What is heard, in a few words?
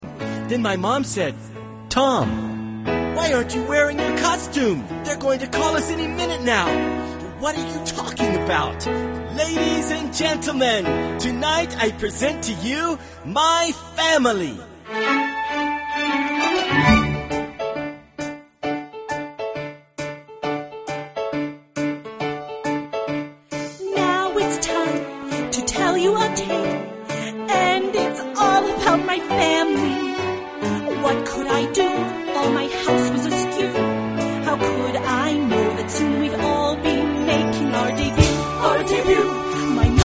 tom